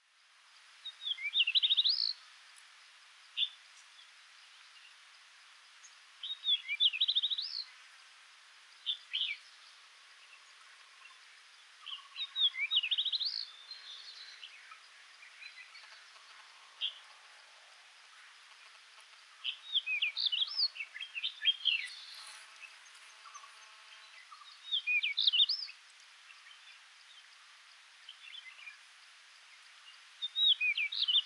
Jerdon's bush chat

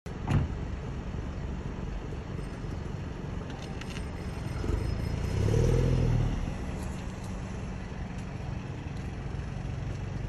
Mini John Cooper; مینی جان کوپر

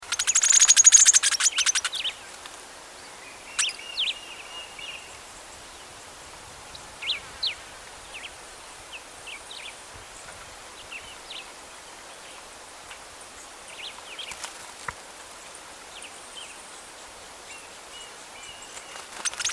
Zapata sparrow

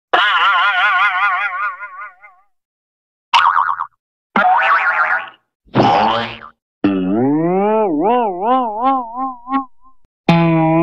Boing

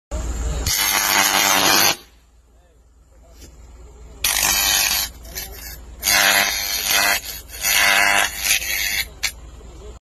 High voltage power

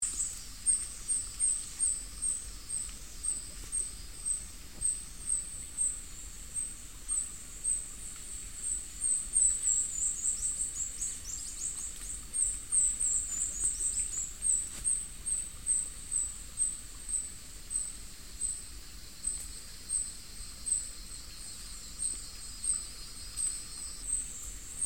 Minute hermit, پرنده; گوشهگیر ریزه

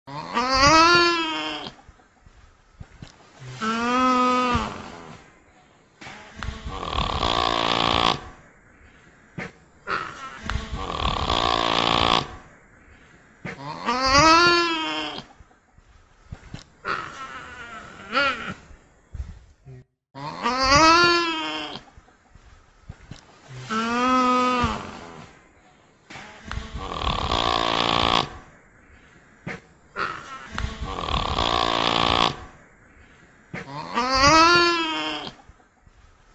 Nutria; نوتریا

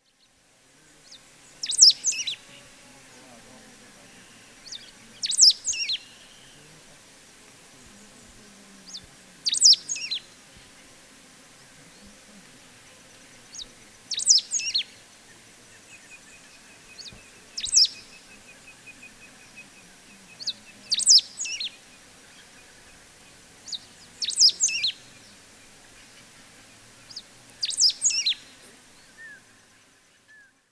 Serra finch

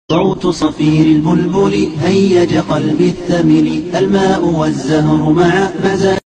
soot safir al bolboli, صوت صفیر البلبلی

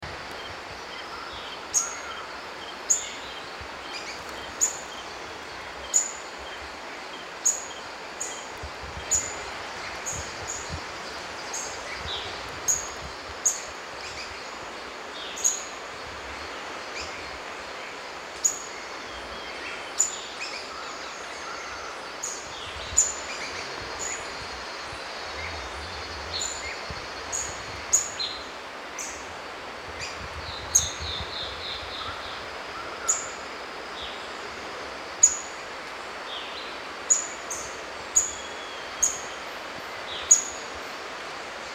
White browed foliage gleaner; پرنده برگ چین سفیدابرو

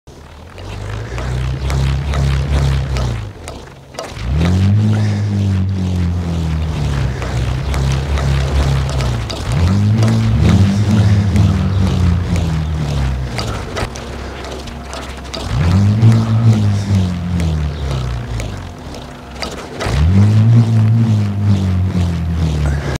Bullroarer

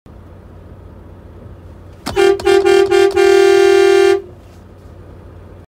Volvo 500 horn; ولوو ۵۰۰

